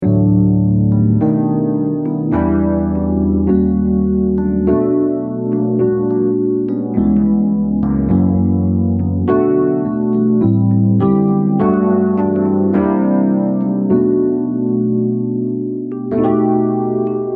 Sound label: Pianos